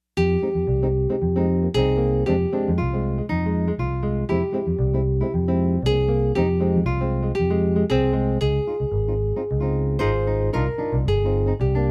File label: guitar